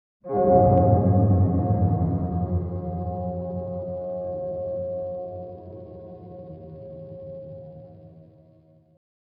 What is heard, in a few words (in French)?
Vague ample